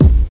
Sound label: fresh raw kick sound a sharp key, bass drum single shot tuned to the A# note; kick drum samples